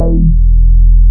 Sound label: BAS Mini; Best Service Gigapack 2